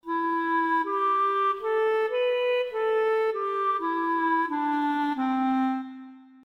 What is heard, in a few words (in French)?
Clarinette avec pitch; Realisme